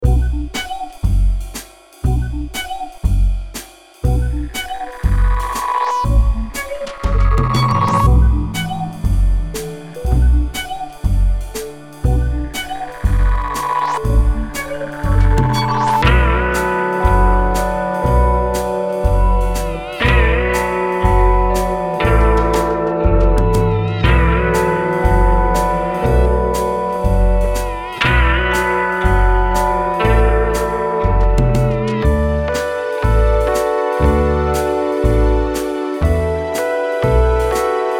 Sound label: Freaky Swamp; Noisebiscuits